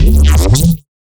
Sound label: Bass Shot; neuro; BIG5AUDIO